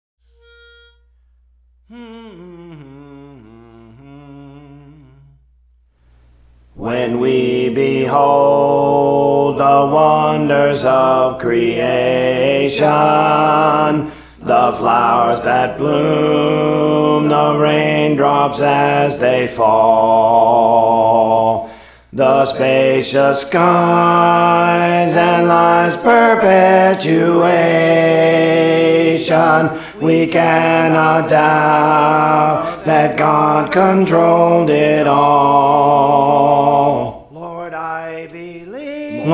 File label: Alto, Songs; Praise For The Lord Training; Lord I Believe